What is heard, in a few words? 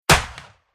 Firing Range Large Hunting Rifle Elephant Gun Single Shot; Weapons